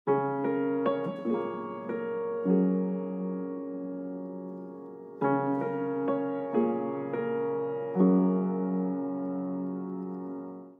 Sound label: Original Piano Sample